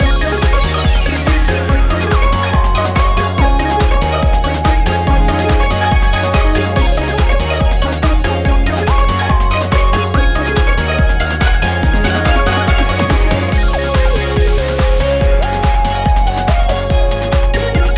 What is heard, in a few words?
BGM RESULT CLEAR